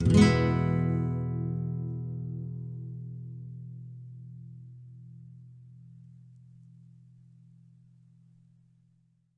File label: Nylon Chord Emaj; Nylon Guitar Chords